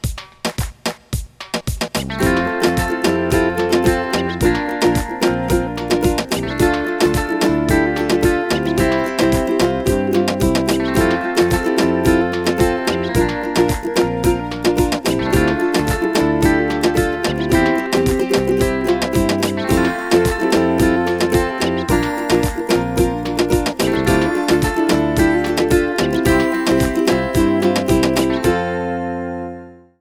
Jukebox Uke

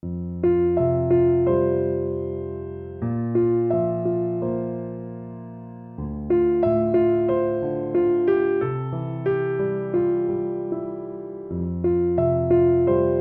Piano Poem

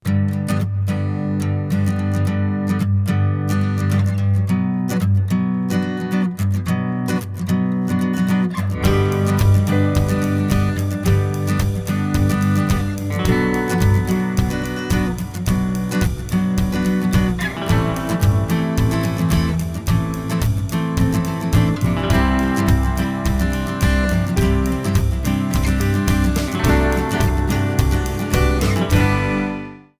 Acoustic

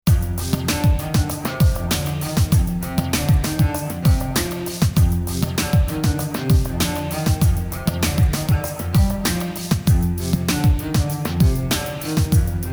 Cello Dance

